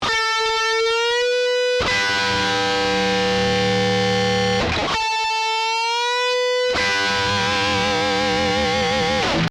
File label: Double Stop